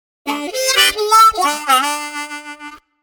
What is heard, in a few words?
harp